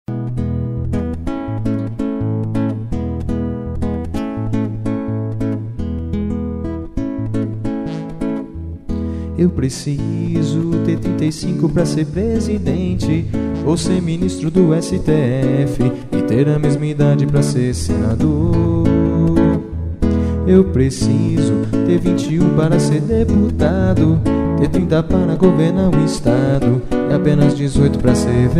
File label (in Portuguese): samba